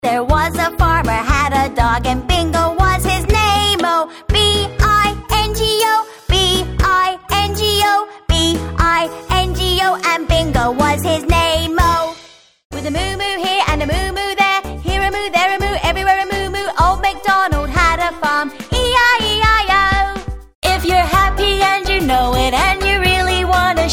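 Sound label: British English, songs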